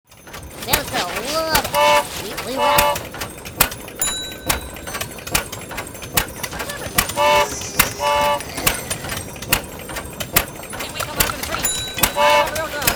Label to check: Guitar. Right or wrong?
Wrong